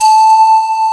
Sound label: pan